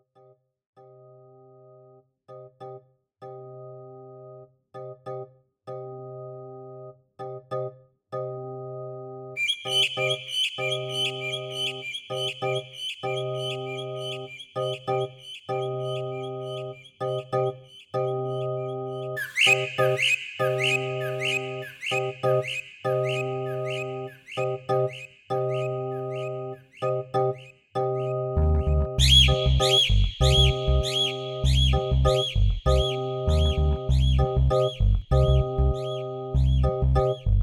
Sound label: bird elay